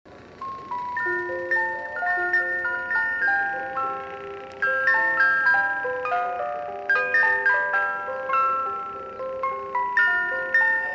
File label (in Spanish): cajita de musica